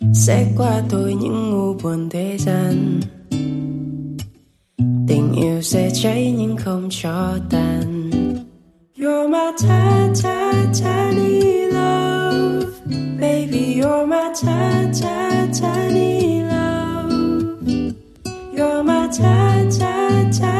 Remix Hot TikTok

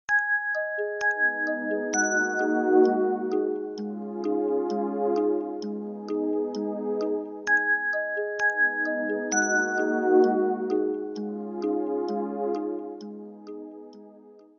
nokia clock alert; Nhạc Chuông Nokia Clock Alert

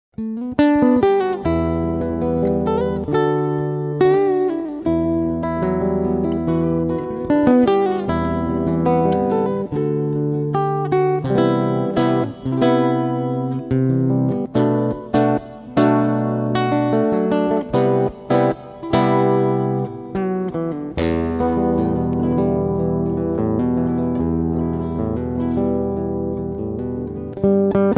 guitar; Nhạc Không Lời

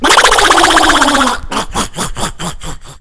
duck laughing

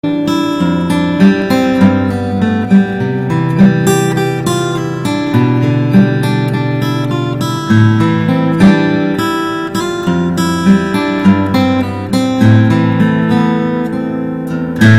guitar

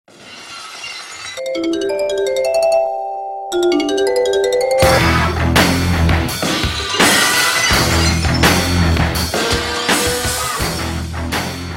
Sony Ericsson Rock

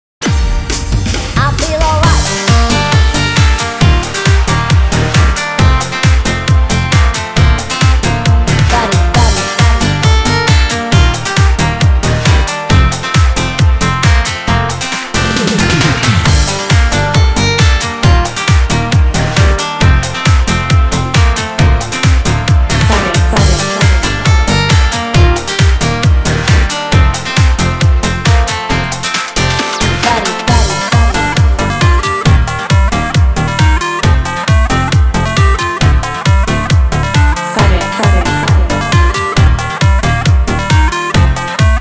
guitar

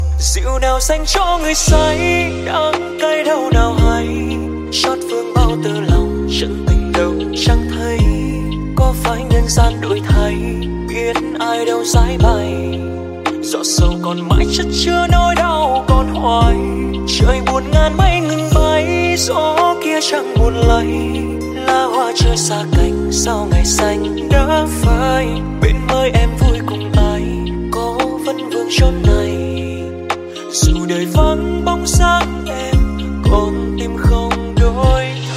Lofi

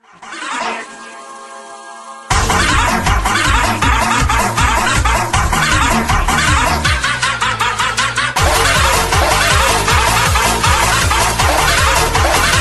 remix

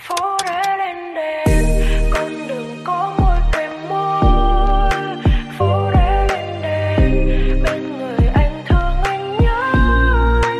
Lofi

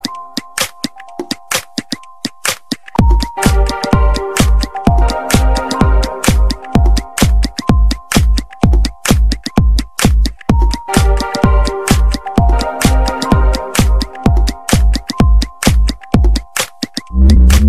nokia lumia 800d

